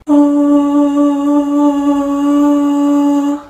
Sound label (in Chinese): 美声